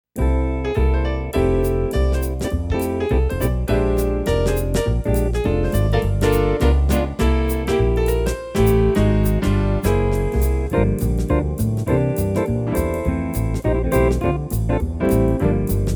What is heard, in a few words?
Style Jazz Fast; Tyros Style